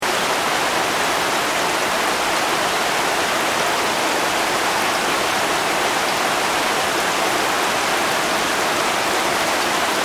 Weather Rain Roof Heavy Ambience Loop

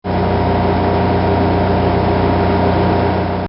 Generator Welding; Construction

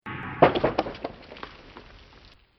Arcane Magic Death Spell

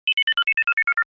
Computer Beep: Odd Effect; Communications; Communication Sound Effects; Communications (Sound Effects:Science Fiction); Stuart Duffield

